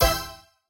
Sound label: scatter short; spinsane